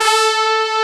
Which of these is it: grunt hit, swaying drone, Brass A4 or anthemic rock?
Brass A4